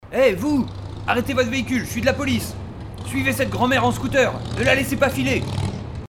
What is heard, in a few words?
Police; Test DeVerberate